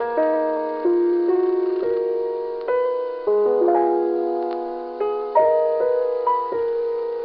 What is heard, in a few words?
piano, sad loop